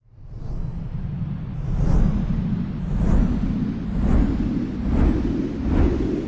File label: fan on; CounterStrike; cstrike